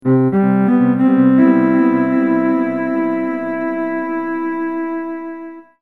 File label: spacey cool viola